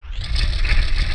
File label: Water Temple Statue Level Start; Zelda; OOT